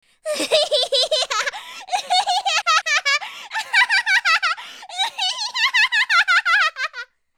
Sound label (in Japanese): kuruiwarai